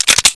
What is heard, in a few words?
handgun slide, weapons; svencoop